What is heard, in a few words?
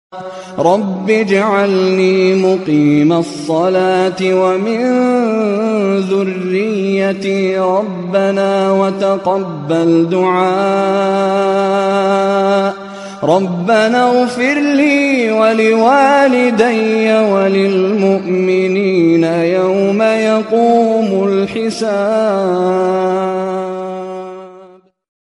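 azan, allah hu akbar, islamic; mishary rashid alafasy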